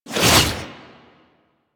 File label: Inf Transform Claws Whoosh, Infested